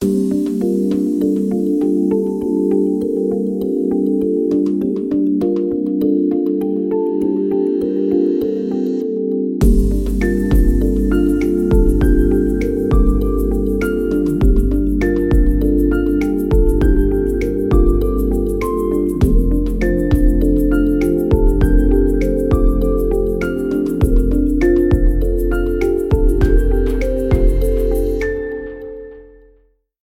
Inspiring Background; MIUI12

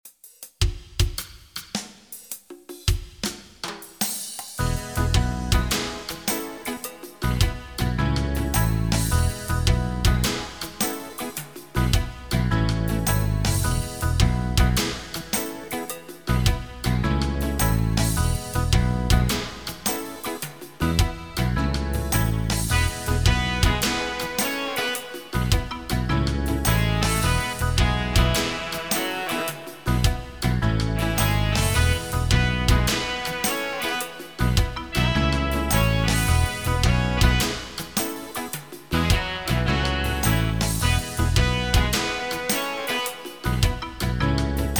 Reggae; Reggae Man (60s); MIUI12

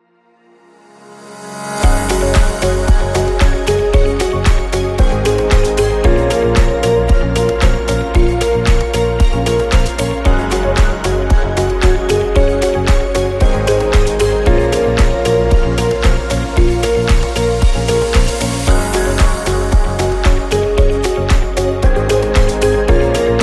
Background Technology; MIUI12

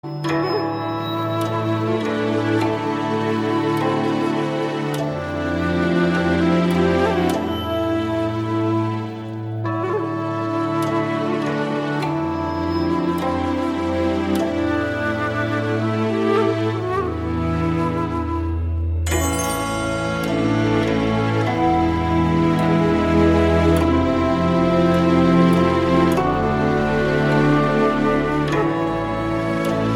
Chinese Style Classical Music, ringtone; The Good Shall Prevail

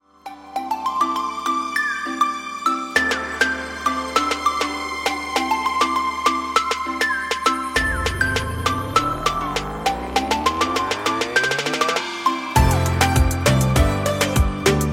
Chinese style dynamic dance

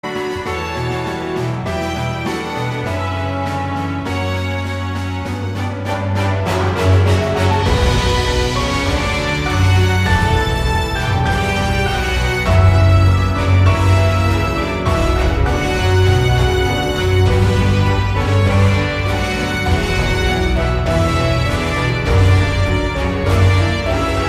Orchestration; Orchestration Three; MIUI12

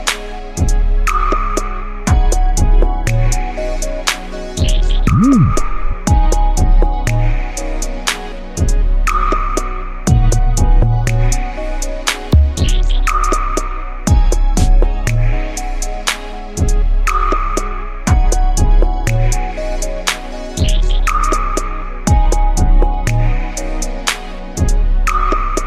R&B

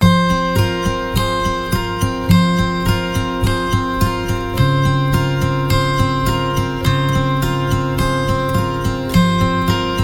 Acoust Dramatic II; MIUI12

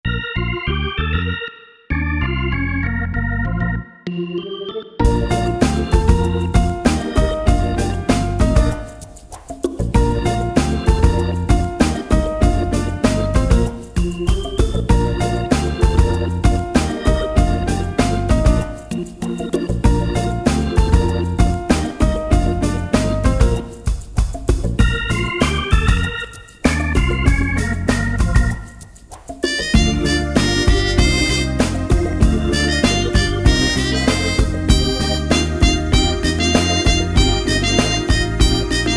worm blues; MIUI14 and HyperOS 1.0

